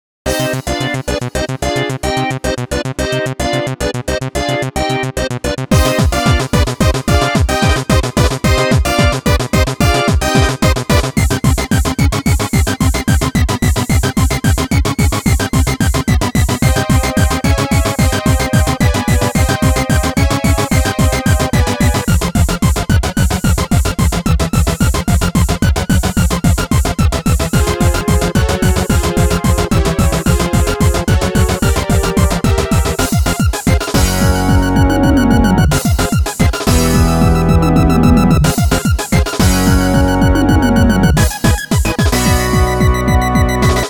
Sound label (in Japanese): 宇宙人襲来, BGM